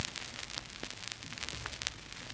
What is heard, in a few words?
Vinyl Dirt; Loops; Texture; Ableton Live 12 Lite; Core Library